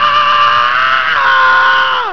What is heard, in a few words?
SCREAM; SCREAMJO